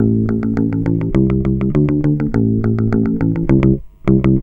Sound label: bass, Roots Funk; USB Soundscan vol.41; AKAI